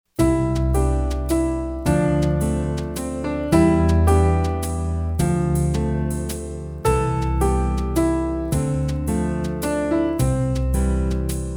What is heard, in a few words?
Midnight Waltz